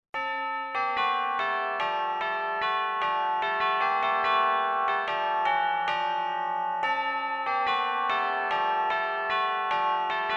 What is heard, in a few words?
Deck the Halls, bells